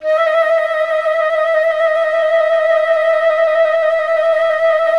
FL TL MJ D4; Best Service; Peter Siedlaczek's Advanced Orchestra

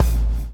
BLOW; Spectrasonics - Backbeat

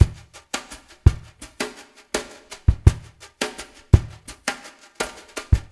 SNT DR WET, Drums; Spectrasonics; Liquid Grooves 1 & 2

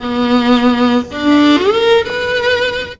VIOLIN LINES; Best Service; World Colours

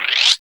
SYN GUIRO LG; TEK PERC2

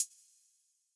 Closed Hat, Belgium Beats 132bpm; Big Fish Audio - Old Skool House; OSH Construction Kits

